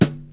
Sharp Snare Drum D Key; snare drum samples

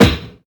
low tone snare sound D# key; snare drum samples

